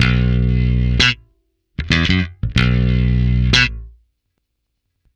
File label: Bass B Funk